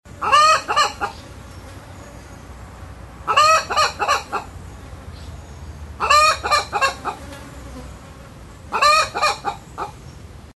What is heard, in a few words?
village hen clucking